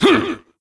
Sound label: Wound Medium; Varimathras